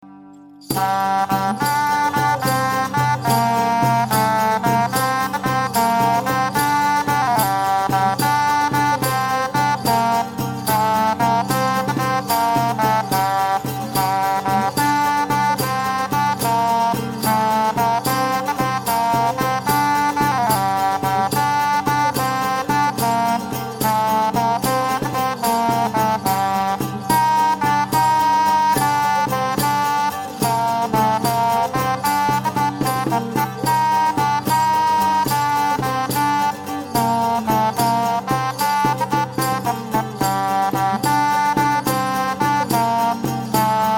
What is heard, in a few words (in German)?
Keltischer